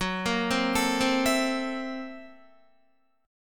F sharp 7th Sharp 9th Flat 5th F sharp down Guitar; arpeggiated; JGuitar